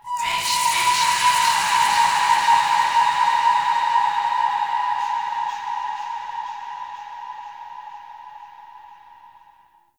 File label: Spirit Tree Holy Chatters; Ambience; One Offs; Glades Of Andurin